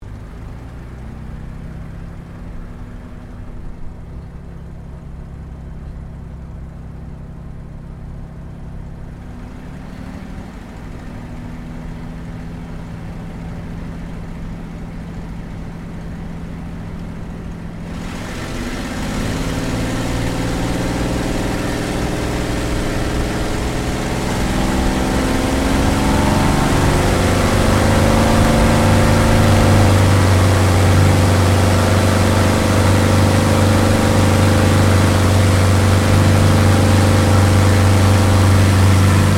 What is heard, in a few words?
AIRCRAFT CAC CA-18 MUSTANG MK23 TAKEOFF INTERIOR; CA-18 /P-51 Mustang; Airplanes (Sound Effects:Vehicles)